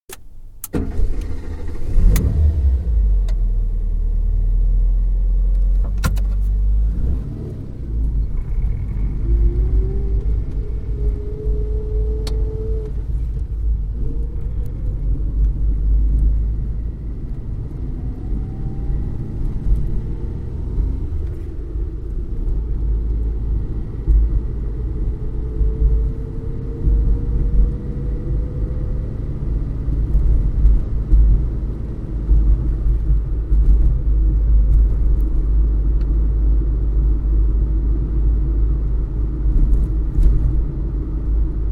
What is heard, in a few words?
Ford Fiesta, interior, switch on, constant run, switch off; 11 litre hatchback, 1986/7 model; Car; Car Sound Effects; BBC